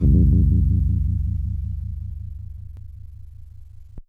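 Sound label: FM Bell FX DX100 G#1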